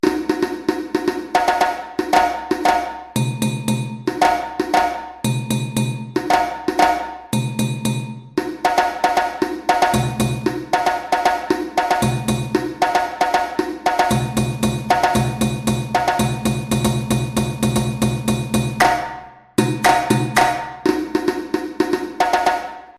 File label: Bolon Break 230 bpm, djembe